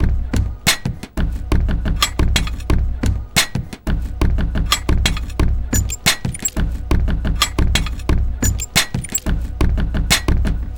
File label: foley intro drums; looperman